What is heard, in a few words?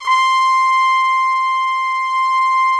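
BRASS C5 right, ORCH BRASS; Greytsounds VOL-1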